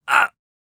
VO Death; VIP

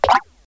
Backpack pickup; Godbox

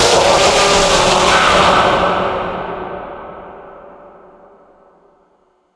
Jumpscare; Five Nights at Freddy's; Ultimate Custom Night; Jumpscare (Fredbear)

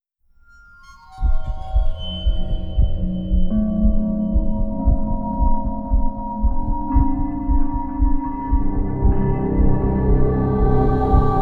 A Heartbeat Away; Myst III Exile

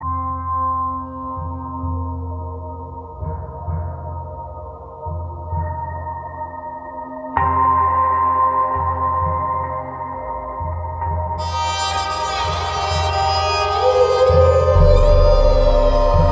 LOOP; Strike Suit Zero

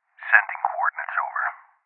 Sniper Pin Point

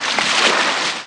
Footstep water Heavy

